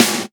Snares; Vengeance Samples; VEH2

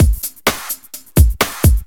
Housebeats, 128BPM; Vengeance Samples; VEH2 Loops

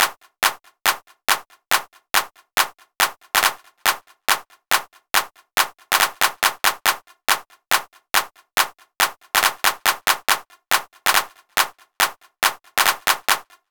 Male Clap, 140 BPM; Vengeance Samples; Vocal-Hook Kits; Song Kit; Stop!

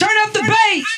Vocal Phrases; Vengeance Samples; Vocals

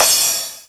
Crash, Cymbals; Vengeance Samples

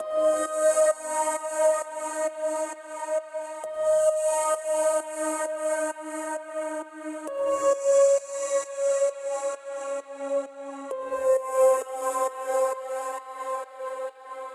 Air Pad, 132BPM, G# major; Vengeance Samples; Jericho Kit

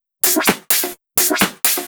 Electro Loop 128BPM; Vengeance Samples

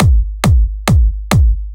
Universe Kit 137BPM Kick 4 4; Drums & Perc; Vengeance Samples; D minor

